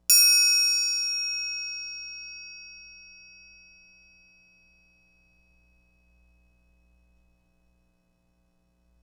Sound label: triangle